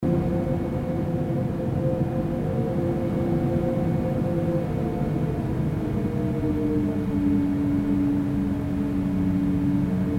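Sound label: Hospital Floor; field recordings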